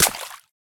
puddle step; Golden Crayon Sounds

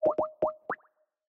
Call Dialing; Skype Sounds

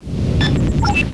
Folder Opened; Blind Yoda Sound Scheme; star trek sound pac

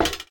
metal step; Golden Crayon Sounds

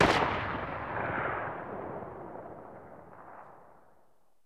hunting rifle dist; Original TK Sounds

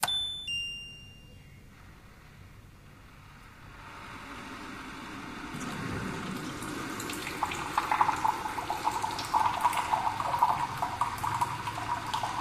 water dispenser; Golden Crayon Sounds

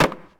plywood land; Golden Crayon Sounds

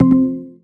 begin short; iOS Sounds

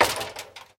ladder step; Golden Crayon Sounds

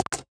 typing mono; BSI Enabled Typing; iOS 27

